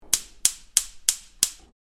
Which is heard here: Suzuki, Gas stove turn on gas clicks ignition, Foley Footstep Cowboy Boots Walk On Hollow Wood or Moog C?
Gas stove turn on gas clicks ignition